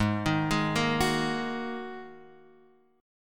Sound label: Ab 7th Suspended 2nd Ab, Guitar Half Step Down